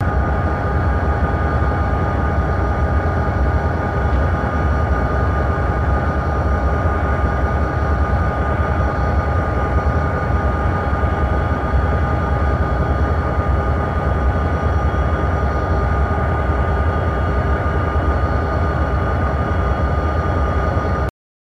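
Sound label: Diesel Locomotive Idle Loop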